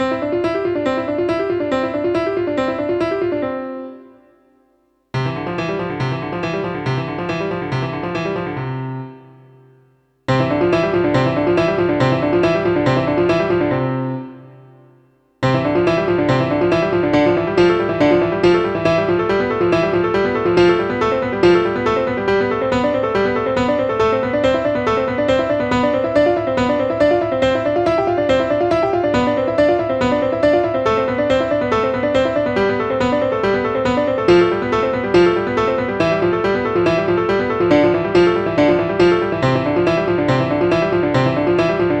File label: 5 Finger Exercise Harmonized in Octaves, 10ths & 6ths